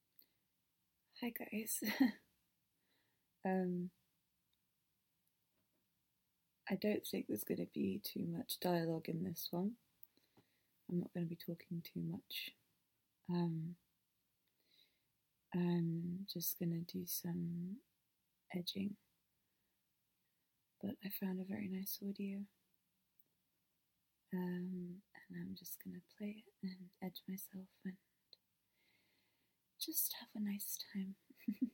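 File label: [F4M][F4A] [Moaning][British Accent]; On the Edge; Edging; Denial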